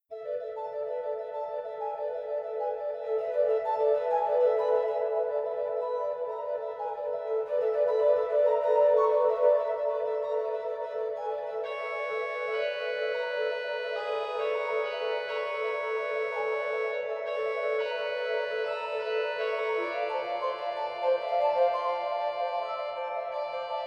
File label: Flutes clairon; organ; organteq2